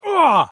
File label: pain